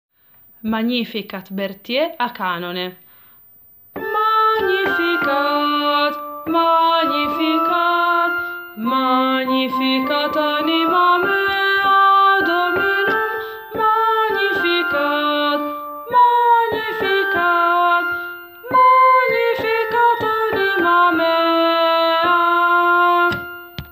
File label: Magnificat a canone; Berthier